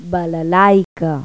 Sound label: BALALAIK